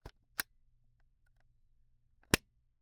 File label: Camera, Kinusa K77, 50mm lens, plastic, film compartment, open close, creak, pop; Eiravaein Works; Vaeyan IV